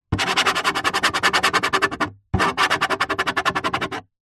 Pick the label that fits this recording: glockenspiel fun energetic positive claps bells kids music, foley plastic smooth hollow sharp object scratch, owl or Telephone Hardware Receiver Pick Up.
foley plastic smooth hollow sharp object scratch